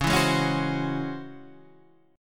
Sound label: Db Minor Major 11th Db Guitar Standard; DbmM11 strummed; JGuitar